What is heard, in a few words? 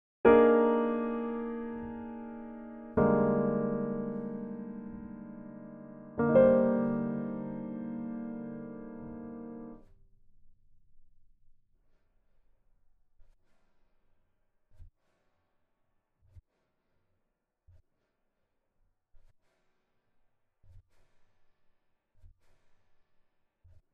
diatonic composition